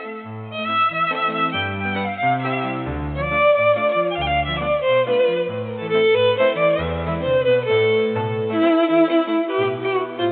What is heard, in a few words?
Violin